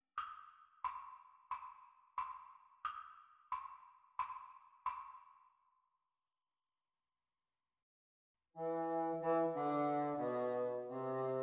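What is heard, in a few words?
bassoon duets practice speed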